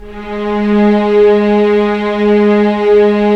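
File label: STR SC, string section; Roland - LA Composer vol. 1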